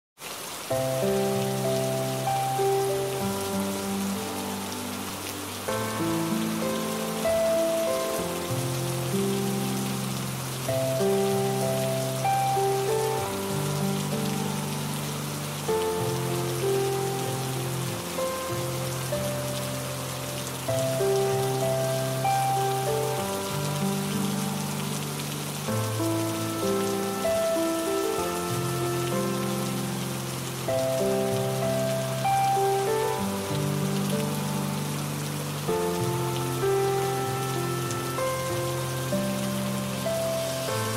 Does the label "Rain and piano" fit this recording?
Yes